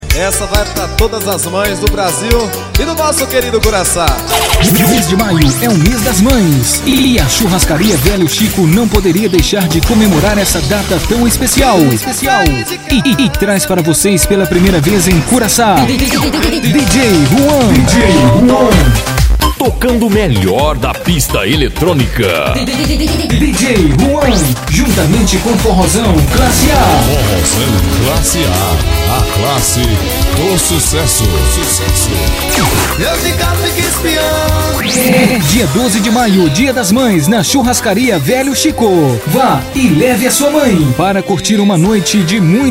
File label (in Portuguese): vinheta